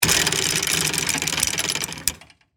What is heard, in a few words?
Chain Pulley Pull, Industry, Chain, Pulley, Pull; Factories (Sound Effects:Backgrounds)